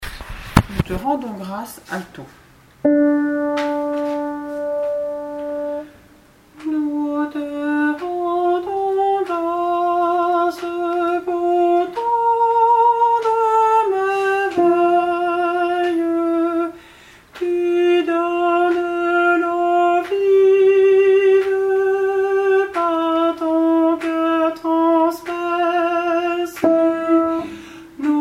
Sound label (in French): Nous te rendons grâce ALTO